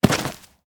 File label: Jump Dirt Land